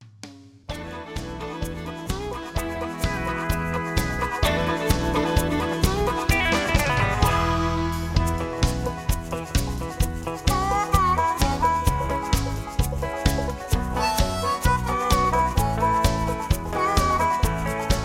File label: Patter